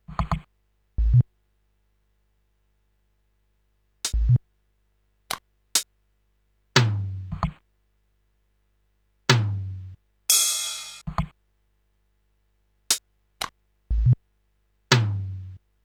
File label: stereo beats loop